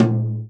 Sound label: tom, Real Drum Kit, jungle kit